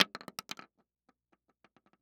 Weapon Bullet Shell Shotgun Multiple Shells Fall On Wood Floor; AdobeCC